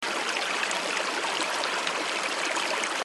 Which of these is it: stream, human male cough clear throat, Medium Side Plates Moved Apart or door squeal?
stream